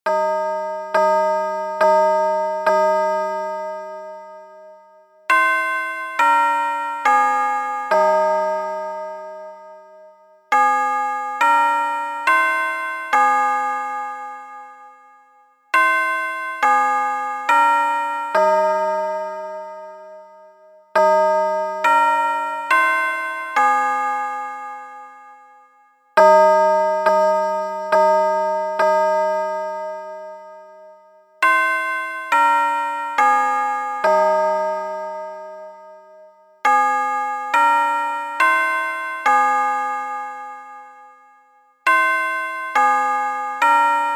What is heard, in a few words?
CHIME